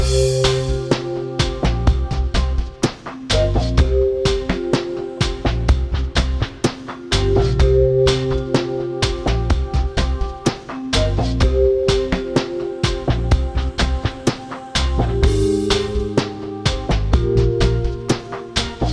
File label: no melody